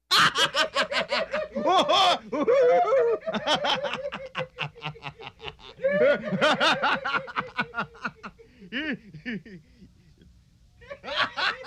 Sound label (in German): Lachen Zwei Lachende Maenner